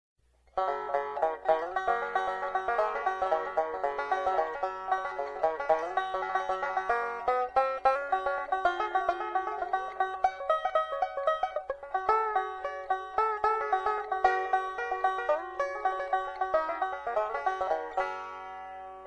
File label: walnut tranjo